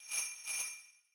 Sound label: tone; Christmas; seasonal